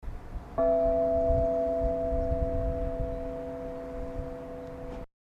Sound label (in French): cloche; Montbolo